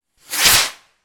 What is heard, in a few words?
firework rocket small launch; sound effects one